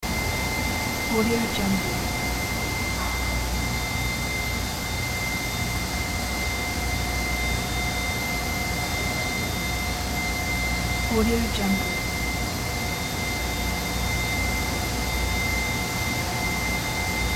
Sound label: petroleum plant machine whir